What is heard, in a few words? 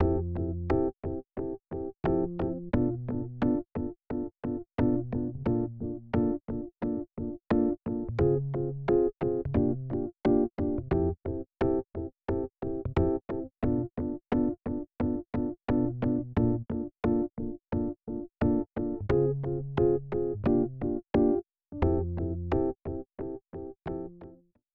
piano